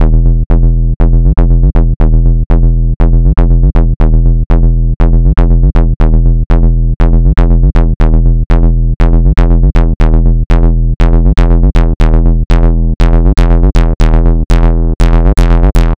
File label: Bass Line; AcidBox V2